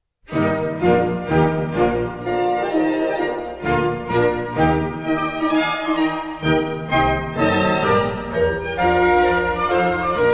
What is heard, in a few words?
Minuets, Eb major; Mozart; Dances and Marches